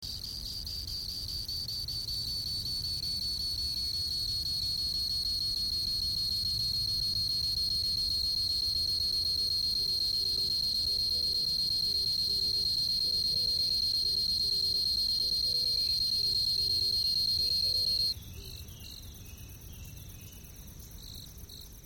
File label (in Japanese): kumasuzumusi